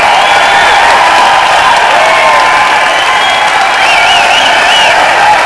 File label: cheer; WhiteOut; XBOX; trojan